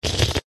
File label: human mouth slurp lips; zapsplat